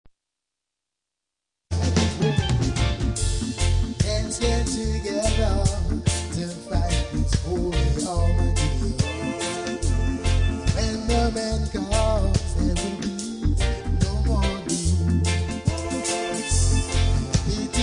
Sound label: Reggae Calypso Soca Dance Band; caribbean